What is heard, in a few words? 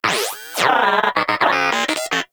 Moa Inquisitive Vocal Enemy Killed; Warframe